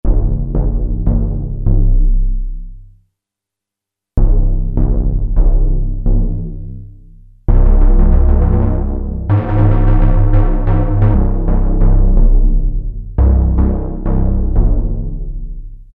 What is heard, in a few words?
Tympani, Prophet-5; Sequential Circuits Prophet-5 (Ver.3); Factory Patch; File 3 Programs